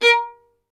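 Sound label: STR violin; Miroslav Vitous; Solo Instruments VOL-2; Solo lnst. II; Vln Misc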